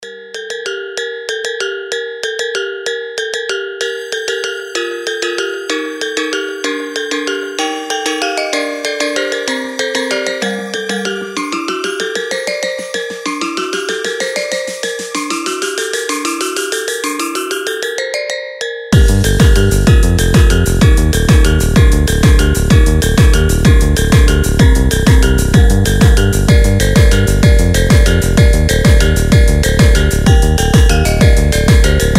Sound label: carol of the phonk bells